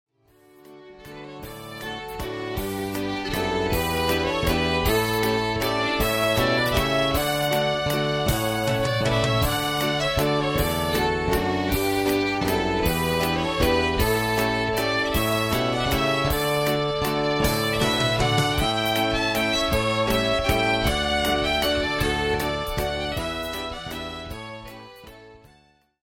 Parma Waltz

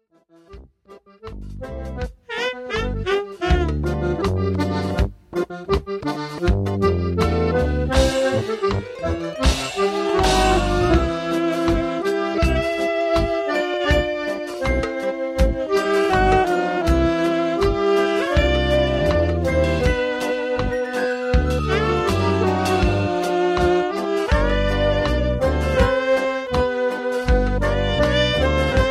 Birdie Dance

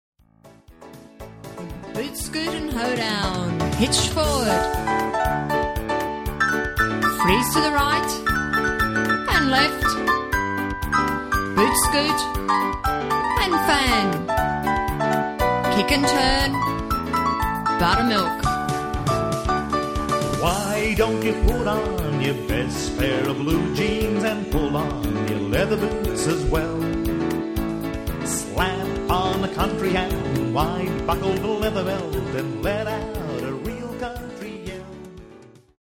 Bootscootin Hoedown

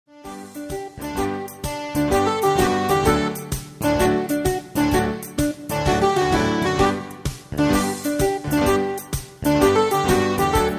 Mexican Hat Dance

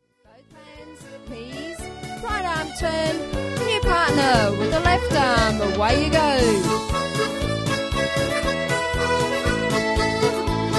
Polka; Brown Jug

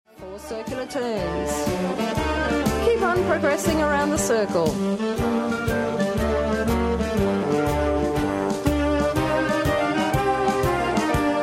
Barn Dance; Progressive

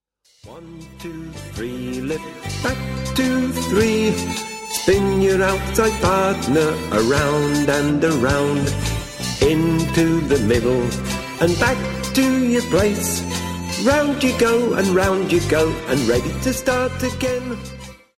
Barn Dance

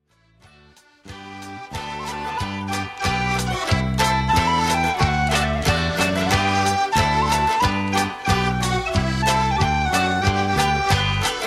Circassian Circle

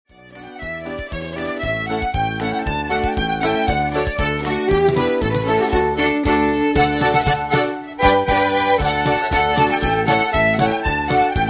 Waves of Tory

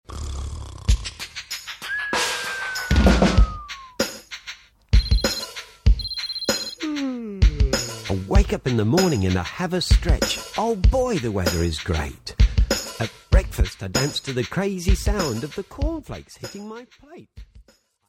Rap

